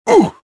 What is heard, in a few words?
land